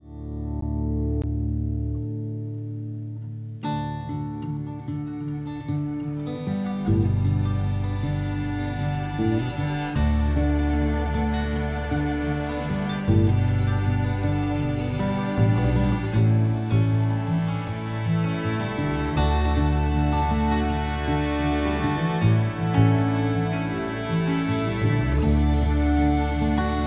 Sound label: riff